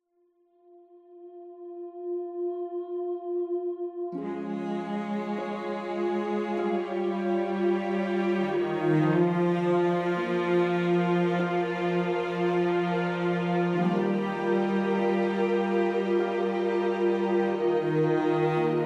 Loop Warm

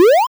Medal

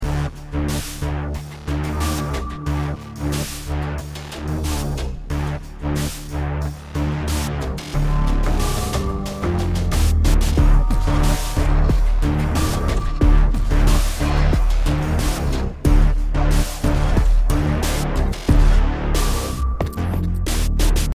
Ghost Intensity

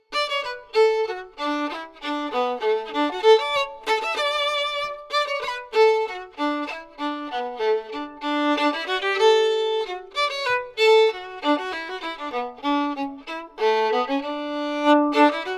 Violin; Assassin's Creed Syndicate

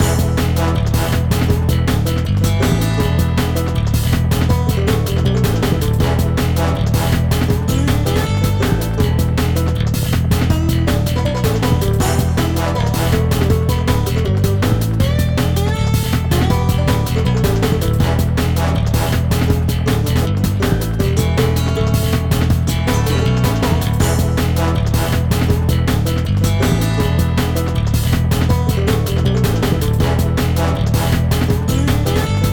BGM stage boss

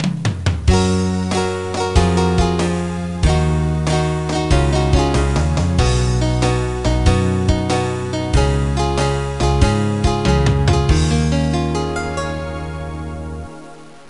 Jingle End; Simple DS Series Vol. 47 The Suiri Shinshou 2009; NDS

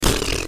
Kingpig Tongue; bad piggies; android; mobile; windows

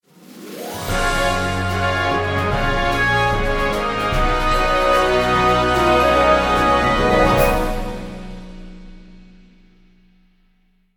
Level Up BGM; lime odyssey soundtrack windows gamerip 2012

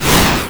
fireball shoot

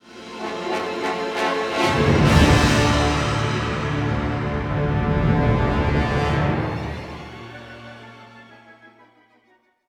Battles Imperial Lose; Hoth

